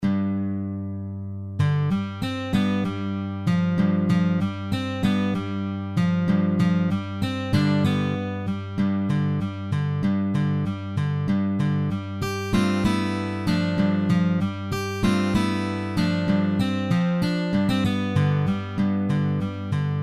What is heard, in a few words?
Acoustic Steel